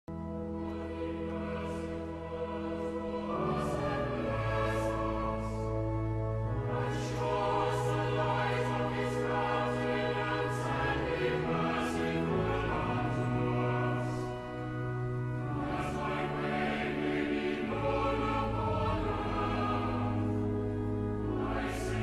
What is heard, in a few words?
choir; psalm67